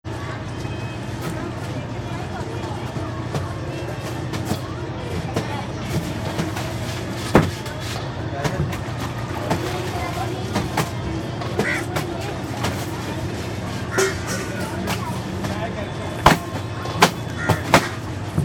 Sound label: mumbay hand washing